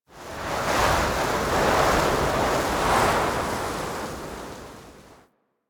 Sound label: Forest Wind Fast Gusts; Ambience; Warframe; Grineer Forest